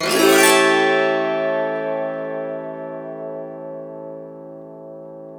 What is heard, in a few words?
AUTO HARP, Auto Harp Min 7